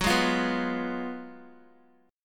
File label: Gb Major 7th Suspended 2nd Suspended 4th, Guitar